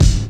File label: Kick, Hard Stepz, drum loop hits; Zero-G Jungle Warfare 1, 2 & 3; Percussion Hits